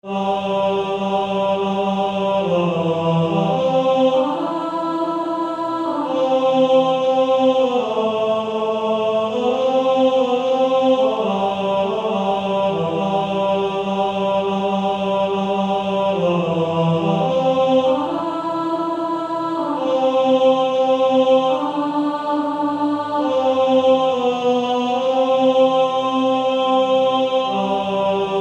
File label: Male Choir